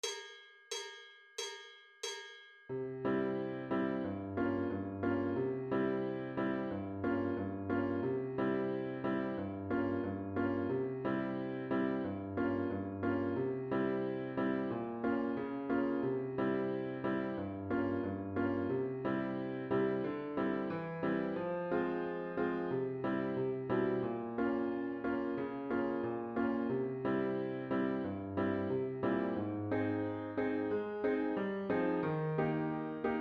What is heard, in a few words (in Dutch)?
Zemer Atik zonder klarinet